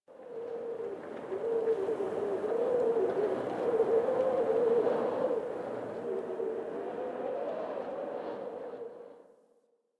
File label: Landscape Cave Wind Howl, Ambience; Warframe; Venus Open World; One Offs